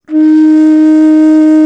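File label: bass bansuri, World Winds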